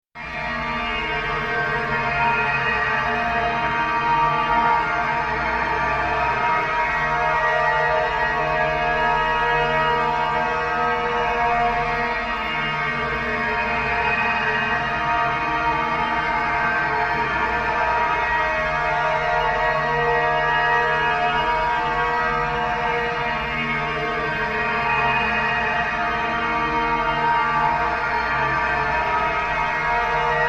Drone Horror Tonal Tension, Drone Horror Musical Tonal Tension Metallic Suspense Eerie; Drones (Sound Effects:Sound Design)